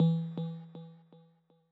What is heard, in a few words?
menu move